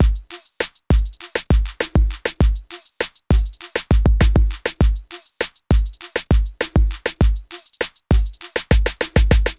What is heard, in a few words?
bounce beat 100